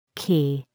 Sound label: key